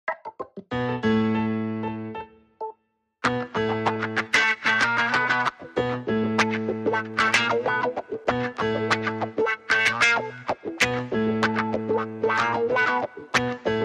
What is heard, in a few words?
Keys & Guitars Only